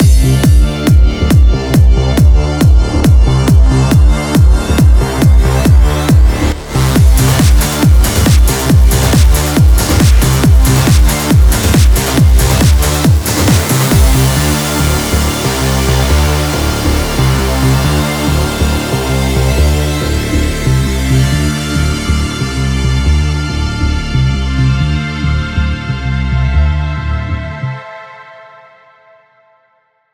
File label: 138BPM The Epic Mix; Vengeance Samples; VFH1 138BPM The Epic Kit